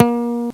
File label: PICK BASS; FAIRLIGHT CMI IIX